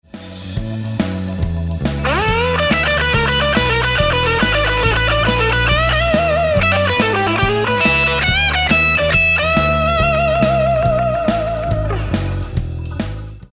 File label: Lick, guitar